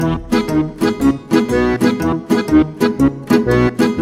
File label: transition music; smii7y